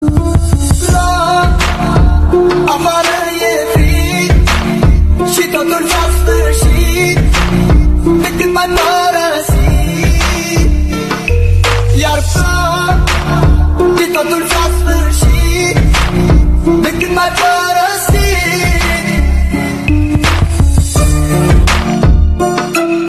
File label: mobile ringtone